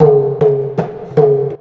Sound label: PERC LOOP, Asian Traditions; USB Soundscan vol.60; AKAI